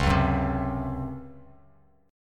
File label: Db Major 7th Suspended 4th Db down Bass Standard; strummed; JGuitar